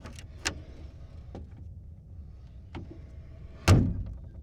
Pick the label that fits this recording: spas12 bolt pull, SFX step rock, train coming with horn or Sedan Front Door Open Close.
Sedan Front Door Open Close